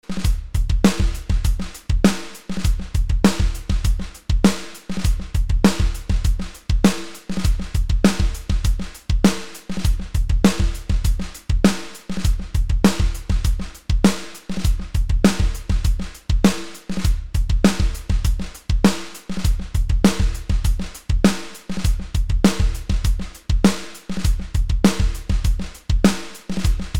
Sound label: Palm Muting Solo Drums